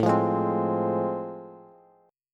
A Minor Major 7th Sharp 5th A Bass, chord; AmM7#5 strummed; 17,20,18,17

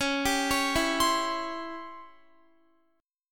C sharp Minor Major 7th Flat 5th Guitar; C#mM7b5; arpeggiated; x,16,17,18,16,19

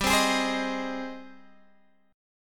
G sharp Major 7th G

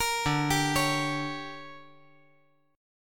D Major 7th Suspended 4th Sharp 5th

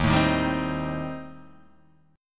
Eb 6th Flat 5th Eb, Guitar DADGAD; down

